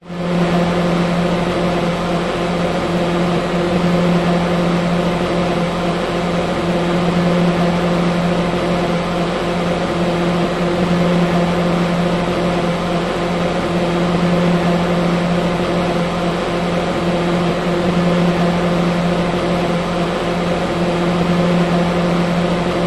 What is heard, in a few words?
household fan small run long buzz